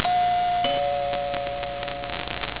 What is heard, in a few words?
doorbell